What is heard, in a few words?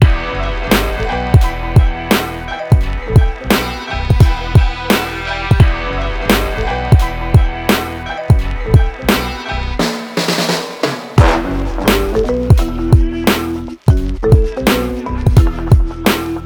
drums